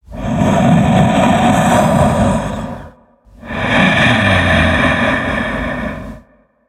Colossus Breathing Idle

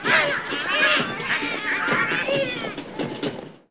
level start military; Angry Birds Seasons

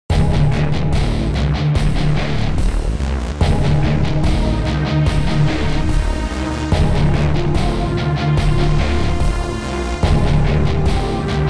Start loop; xpand rally xtreme

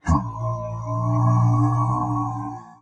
head hum

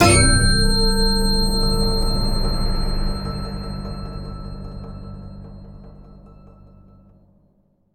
sting Shock; the walking dead michonne 2 give no shelter

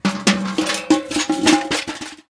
Buckets Jump Scare; Devour; The Inn

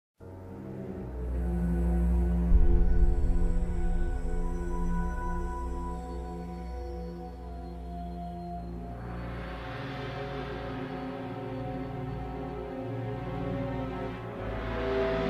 Romulan Ambient; Star Trek Starfleet Command III

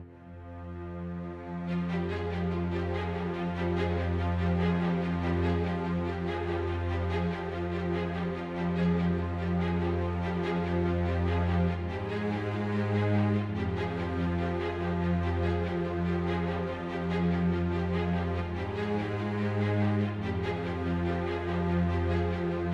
Combat Strings Stem; Necropolis

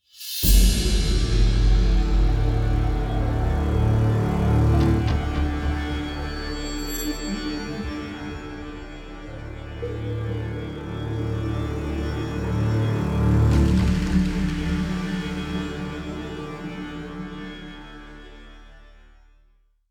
Monster Hunting; Skellige

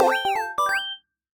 Jingle Game Start!

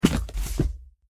Body Falls Floor

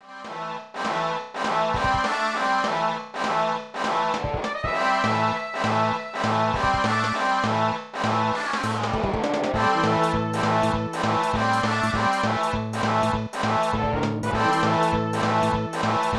Goal; ultra league 1995 snes

